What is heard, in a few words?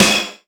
SD, Yamaha RY-10, Drums